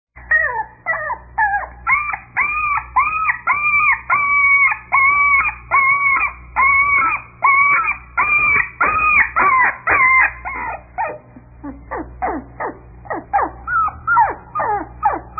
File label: Crying Puppies, Answering Machine Messages